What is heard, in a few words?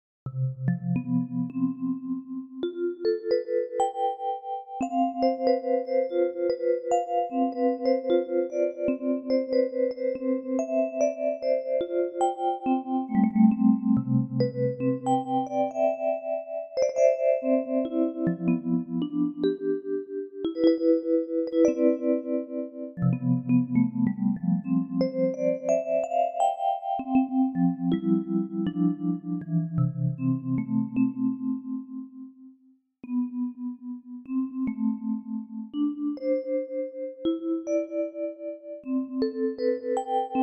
Vibes; NUSofting